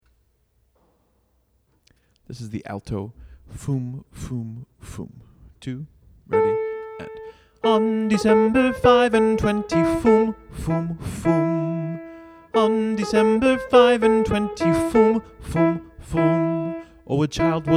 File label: alto fum fum